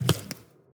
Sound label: Footstep Crawler, Monsters; Footsteps, Monsters